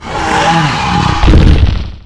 dragon die; Fate; MONSTERS

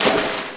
fish splash; Fate Undiscovered Realms

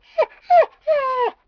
whine, dog; Fate Undiscovered Realms; MONSTERS

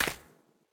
footsteps moss; Fate The Cursed King

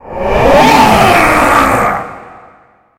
roar, spectre; Fate The Cursed King; MONSTERS

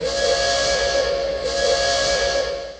alarm; Monster girl quest Part1,2,3; Visual Novels

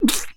attacked, Mud Octopus; Fate The Cursed King; MONSTERS

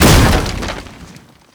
crate break; Fate The Cursed King; ITEMS

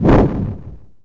slash; Monster girl quest Part1,2,3; Visual Novels

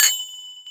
charm drop, items; Fate The Cursed King

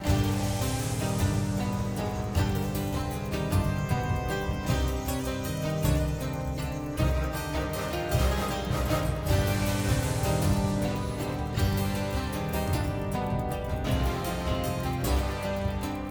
dungeon stinger; Fate The Cursed King

